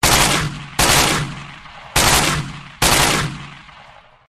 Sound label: Machine Gun Firing